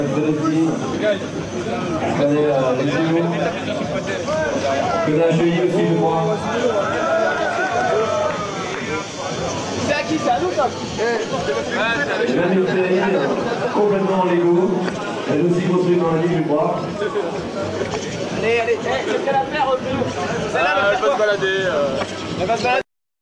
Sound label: Coff